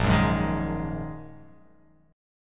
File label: A sharp 7th Suspended 4th Sharp 5th, chord